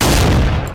mateba, Gunshots; Weapons

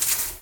water spray; Weapons; Guns; Gunshots

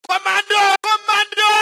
charge, Voices Emotes

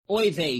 charge, Voices Emotes; civ13; civ14

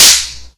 snap; civ13; civ14; Items

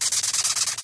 charge

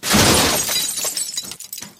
metal glass break

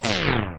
airlock electrify off

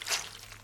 gib step, Footsteps

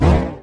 lizard ask

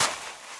sand step, Footsteps

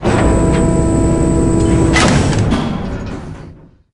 lift; civ13; civ14; Civ Misc

